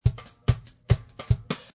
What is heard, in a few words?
Ska Drum; Loopology; Ska Till Ya Drah; Lounge & Reggae